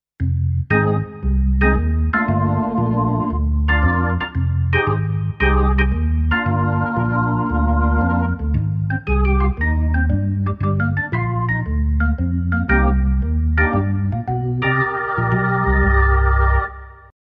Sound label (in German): Sonority XXL Orgel